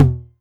tom mid, Novation Drumstation

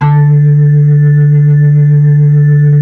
B-3 Blues

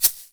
SHAKER 1, Korg EMX-1, drum machines

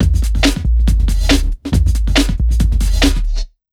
Big Beat Drumloops

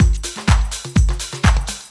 DRM, LOOPS 125, House; Best Service ProSamples vol.10 - House; AKAI

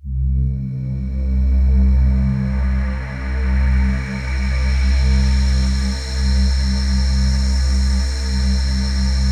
PAD, DIGI-PAD

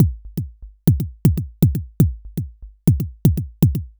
Anabeat Kick

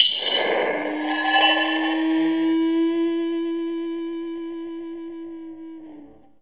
industri axe; COMPLEXHITS

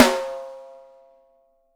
wood; Sampleheads; Peter Erskine Living Drums

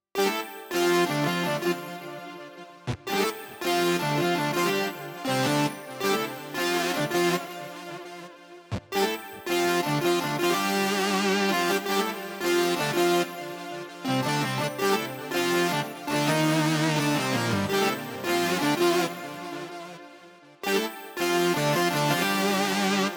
lead C; Big Fish Audio; Legendary; Shes Mine

